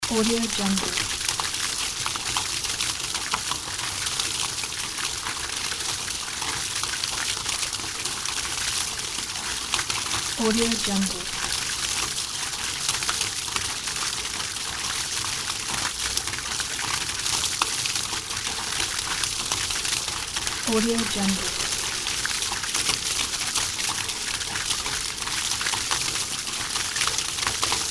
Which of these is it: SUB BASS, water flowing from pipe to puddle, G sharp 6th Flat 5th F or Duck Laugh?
water flowing from pipe to puddle